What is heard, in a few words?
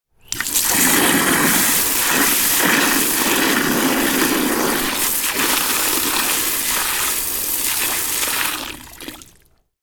household hose spray water into around drain